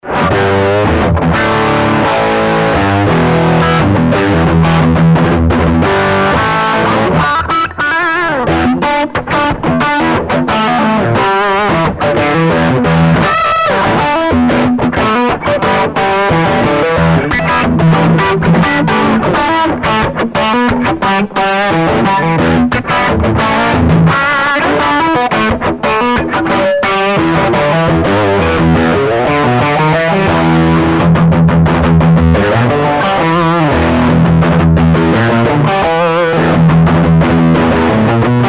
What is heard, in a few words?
Riff; Alexandre Almeida